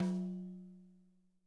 tom, drum

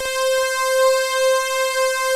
SYNTHETICS, PADS; TR PADS; Pro-Rec - Synthsonic

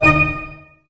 stac A, orchestra; ORCH STAC.-M

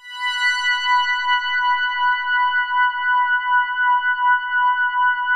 5TH SOFT H4; SPACIOUS; Music Store - Claudius Diemeter Schwerlos; SPACIOUS 1

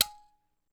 G#4, Kalim M'Bira; NorthStar - Global Instruments VOL-1

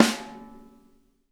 SNARES, Drum Kits

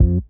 IN HOUSE F2, bass; Sampleheads; Ultra Freakin' Bass; IN HOUSEBASS

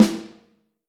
Vintage Snare Drum

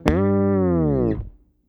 SHRT FALL, EFX FALLS; Bass

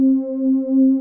ANAPAD C3, ANALOG PAD; Music Store - Claudius Diemeter Schwerlos

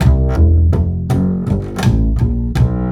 Acoustic Bass

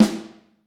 Vintage Snare Drum; Q Up Arts; Johnny.C's Vintage Snare Drum; 7.5X14

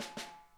SNARE; DBL TAP; Sonic Reality; Interactive Drum Kits; 90s sample cds

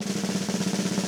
roll, Drumscores, KITS MEDIUM; 90s sample CD

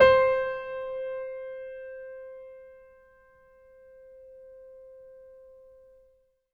MIX R, Acoustic Piano, PIANO STEREO; InVision Interactive; Miroslav Vitous

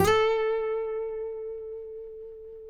FINGER, Guitars

